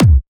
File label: Main Room Kick; essential drumkit